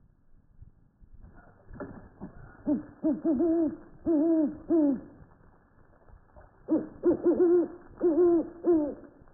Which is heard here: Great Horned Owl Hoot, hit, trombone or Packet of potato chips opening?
Great Horned Owl Hoot